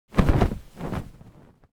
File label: object flag medium flap; smartsoundfx